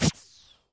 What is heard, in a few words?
Battle Throw; Hilzartov; Fairy Size Doesn't Matter